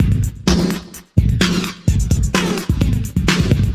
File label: House Beat, 128 BPM